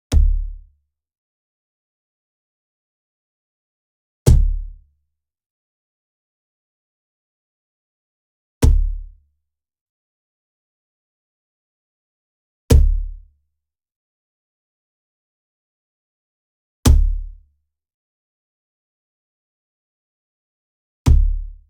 Wood Percussion Cajon Bass Slap Hit Near; boom library; Cinematic Strikes Construction Kit

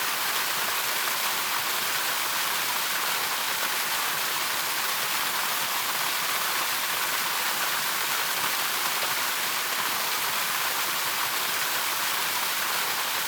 water stream medium

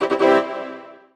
PUZZLE Success Brass Fanfare Wet; stereo; Universal Sound FX

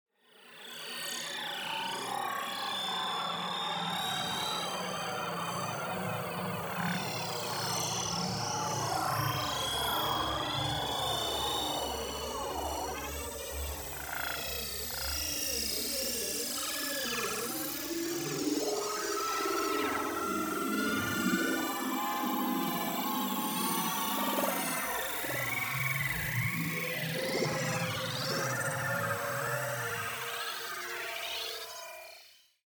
TEXTURE Energy Fragmentation; boom library; Neon

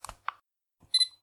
camera on; Apotheorasis

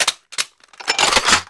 Gun Reload Weapon, Weapons Foley; SFX Kit

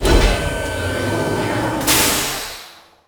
Dump Truck Servo Dump

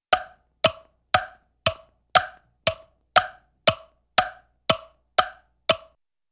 Funny Hollow Wooden Clock Ticks, cartoon; Hollywood Edge; Cartoon Trax

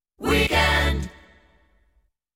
Weekend, jingles

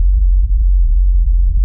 Quake Rumble Loop; Destruction

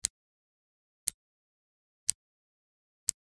Generic Click Metal Tiny; boom library; The Interface

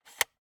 Viewmaster, plastic, lever, release; Eiravaein Works; Vaeyan IV

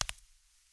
Tile; blindside

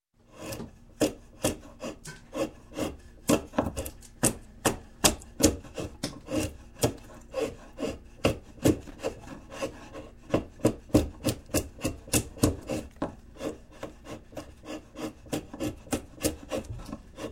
Construction, Chisel Chiseling Wood By Hand; Sound Ideas 2000 Series

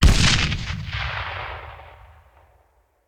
Grenade Hand; Sound Ideas; Guns

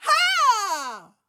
voice attack female; Blind Gladiator

